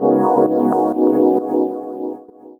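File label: Pad Synth; Equipped Music; Loungin House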